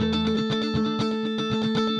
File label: Clean Guitar Strum